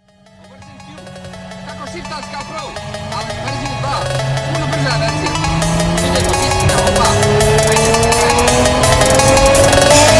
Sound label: Phonk; ringtone